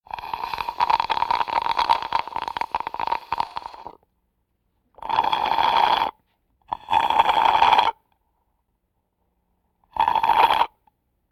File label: Vocal effects breathing Moaning Baby